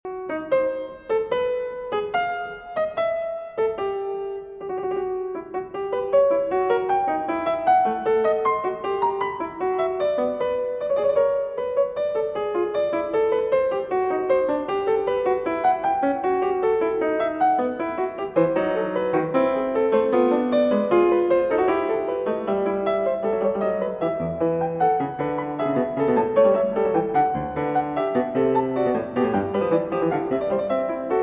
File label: Partita in E Minor, Gigue; Partita No. 6; BWV 830 VII.